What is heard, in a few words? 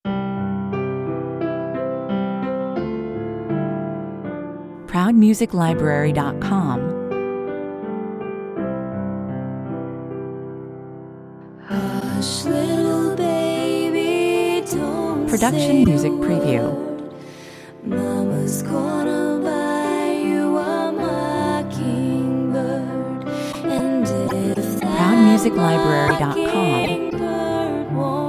hush little baby lullaby (vocal version)